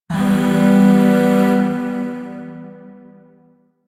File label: Shawzin Maj Chord C; Music; Chords; Warframe; Zariman; Void